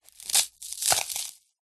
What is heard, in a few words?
polystyrene snap break; foley